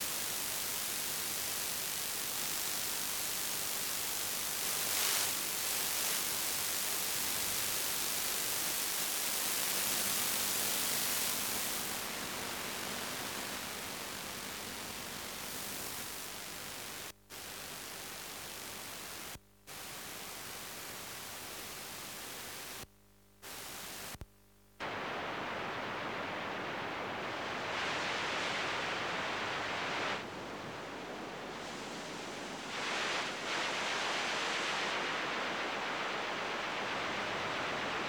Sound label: Radio Interference Static Recorded From Ghetto Blaster